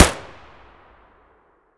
akm muzzle distant, weapons; ak103; STALKER; IWP

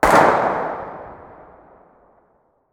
groza muzzle mono far, weapons

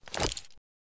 grenade throw

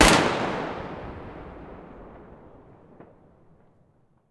groza muzzle mono distant, weapons

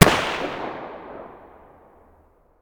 M4A1 muzzle mono far

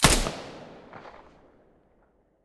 silencer p90 mono, weapons

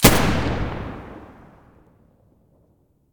muzzle g36 stereo, weapons

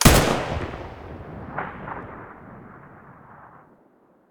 ak105 muzzle stereo, weapons; STALKER; IWP

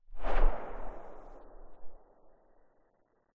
silencer very far, weapons; vpo101

